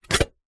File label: medium small sized metal stockpot cooking pot empty lid remove; household; zapsplat